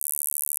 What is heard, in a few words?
Gauss Filt D2